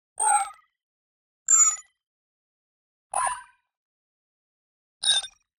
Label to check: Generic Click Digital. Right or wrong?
Right